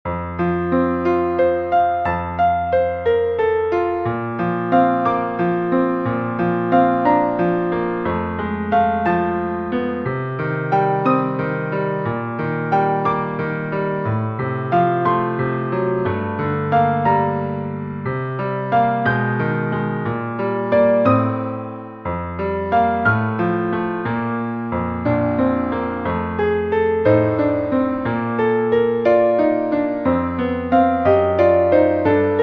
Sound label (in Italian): clarinetto Piano; tu scendi dalle stelle